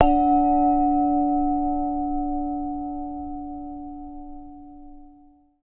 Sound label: BURMESE G, BURMESE GONG; Voice Crystal - Steve Reid Definitive Percussion